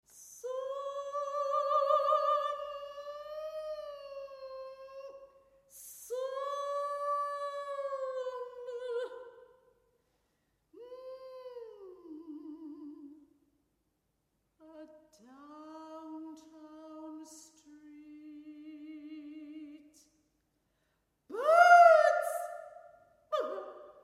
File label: Solo Soprano